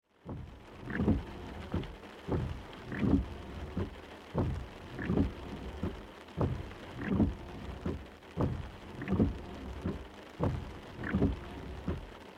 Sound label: Auto Windshield Wipers Low Rain Automobile Car; Vehicle; Cars; Vehicles